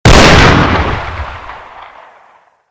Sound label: shot fire low